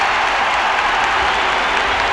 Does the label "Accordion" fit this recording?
No